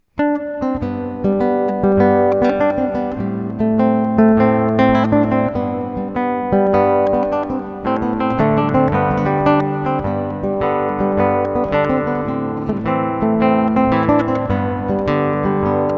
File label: irish dance